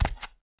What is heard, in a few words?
foot; PC Gamer IT CD 45; SMANDEMO; baddys